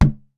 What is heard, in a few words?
Balloon Bounce; SteamVR; InteractionSystem